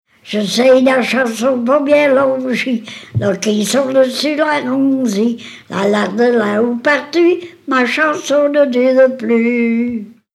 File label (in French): chanson pas bien longue; Francopro